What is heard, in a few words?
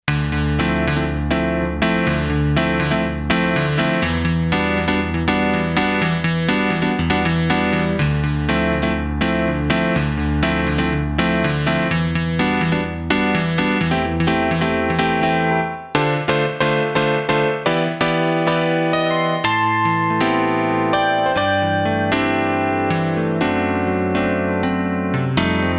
COMP PIANO, Roland SC-880; Factory Patches